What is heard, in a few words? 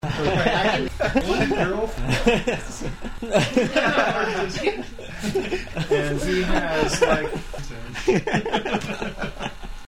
laughs